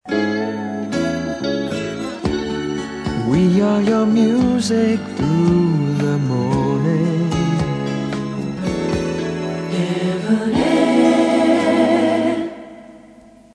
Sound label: music morning, idents